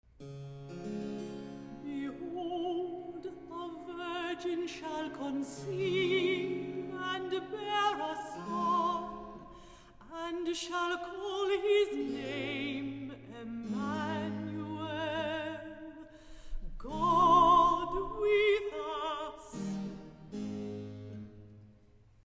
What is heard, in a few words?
Alto Recitative; Orchestral; Boston Baroque; Handel Messiah; Part I - Behold, a virgin shall conceive